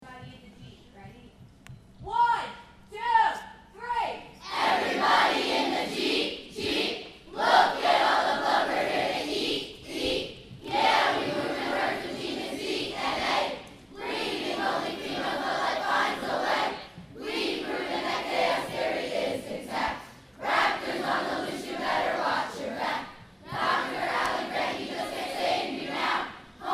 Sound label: Jurassic Park Cheer